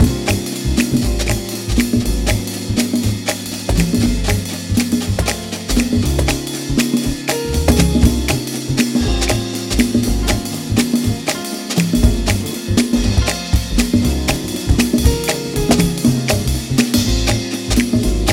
Bass Run

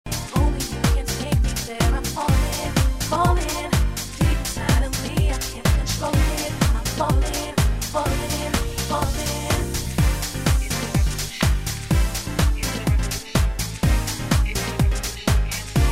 Vocal Mix

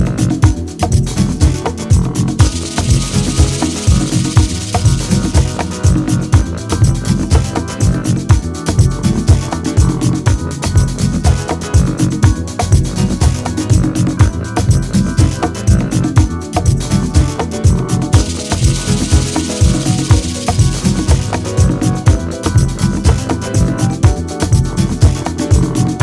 Funkbox Instrumental

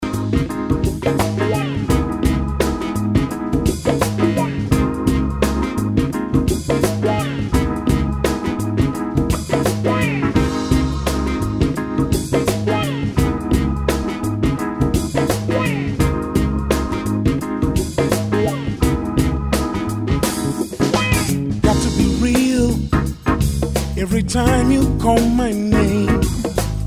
Electro Shuffle Harp Version; Fortnite

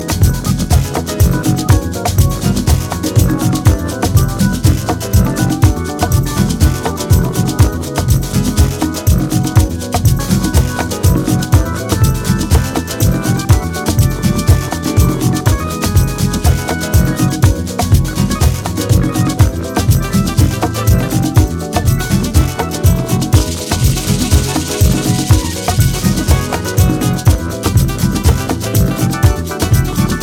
Organ Dub

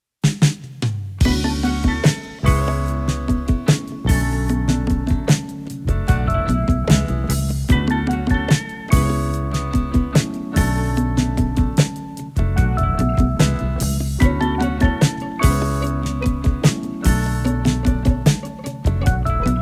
Instrumental

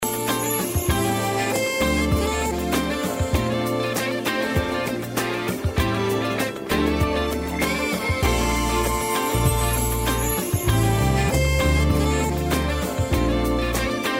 Relazing Jazz Ballads

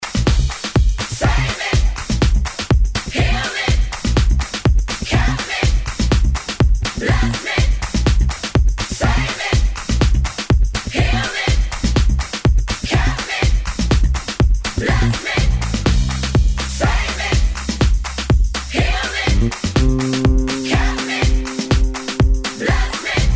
acoustic version

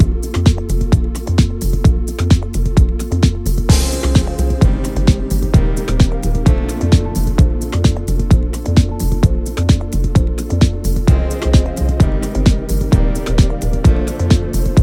Instrumental

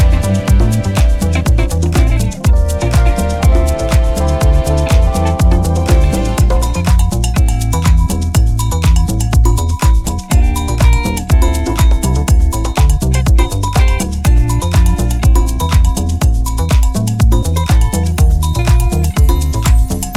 instrumental

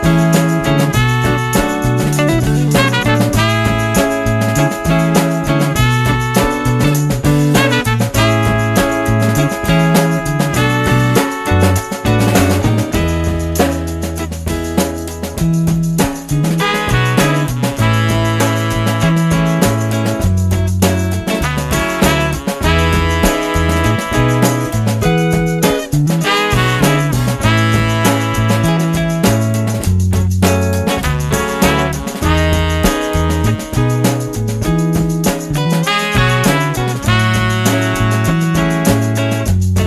Instrumental

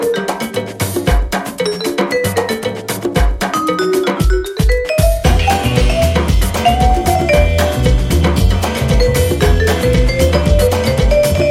CLUB DUB

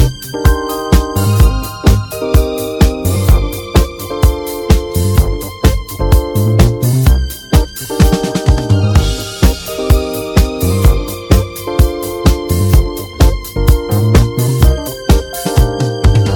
Cosmic Disco

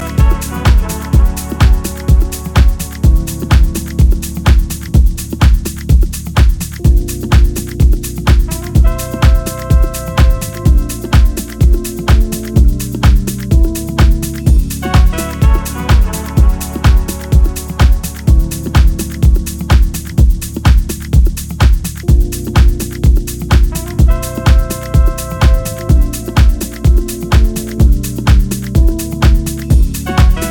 Remix Instrumental

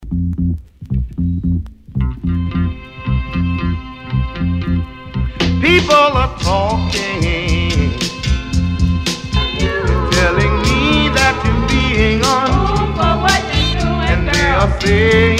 Instrumental

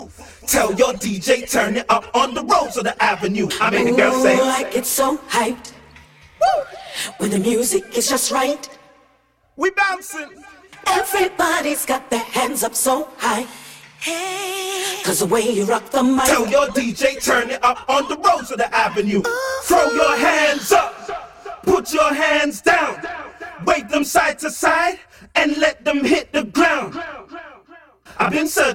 Accapella

